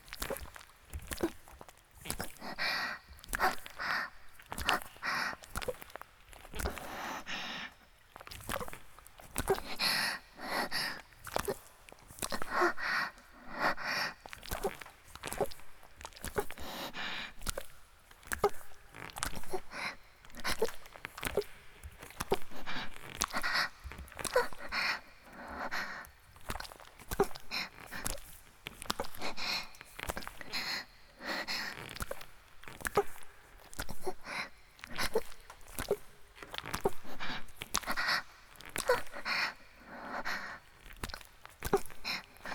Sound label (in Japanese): (雨降りバージョン) (薪爆ぜ音無しバージョン) ギシアンループ; 田舎の別の無感情少女と自己犠牲愛2